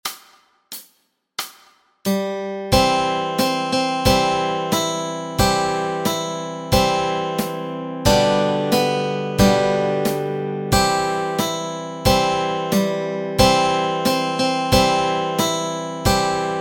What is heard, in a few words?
Wheels on the Bus melody and chords